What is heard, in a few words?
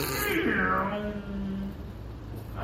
F1 realistic sound